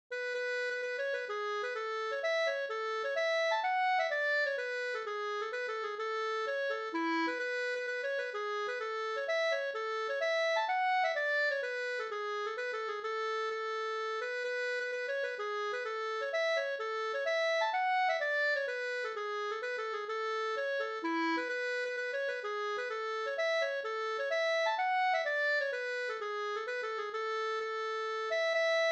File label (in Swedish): polska Trallpolska